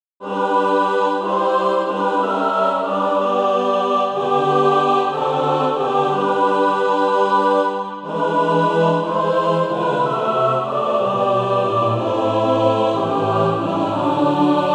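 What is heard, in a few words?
mixed, Practise Music; Firm on the Rock I Stand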